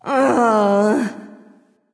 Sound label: "ugh"; smite; voicepack; nike